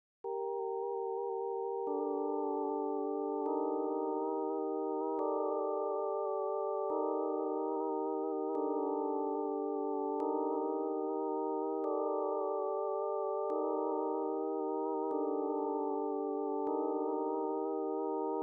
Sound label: boar